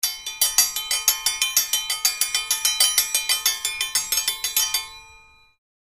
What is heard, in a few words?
Triangle Hits On Tria